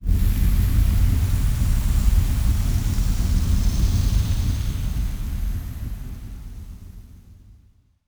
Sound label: dust passage; rifts; Stellaris; astral planes